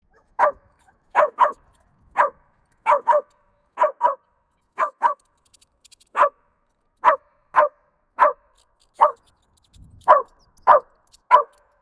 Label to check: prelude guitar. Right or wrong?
Wrong